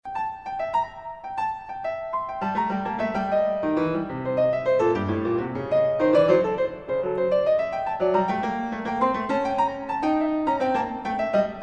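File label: flute, clarinet, bass and piano; MusicadeCamara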